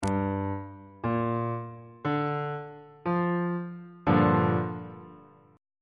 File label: b flat major 6th 3rd inversion on bass clef